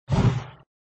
Sound Dull woosh (movement), flipper; Dull woosh (movement) v2

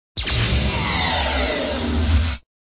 Sound SFX, flipper; sttng

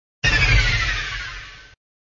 Sound SFX, flipper

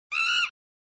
Sound Monkey scream; Monkey scream v2; flipper

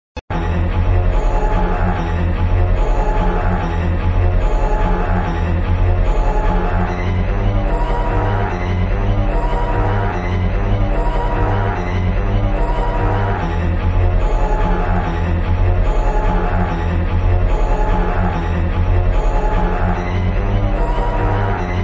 Music, flipper; sttng

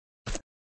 Step; Step v5; flipper

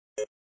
Menusound System Minus; flipper; sttng

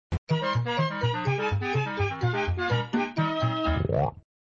Music Fun jingle and frog quark, flipper

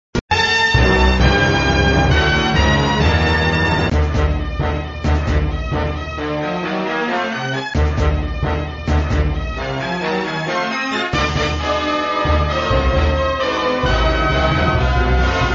Music, flipper; sttng

